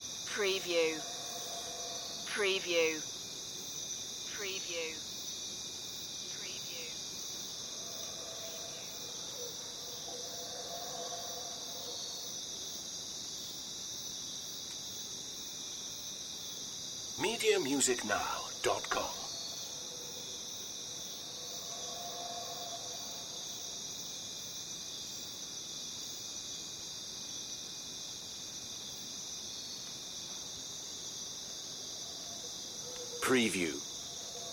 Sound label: jungle crickets